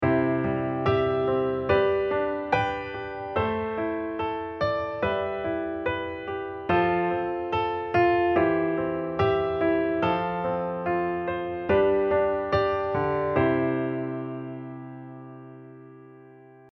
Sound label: LH wholetone, accompaniment pattern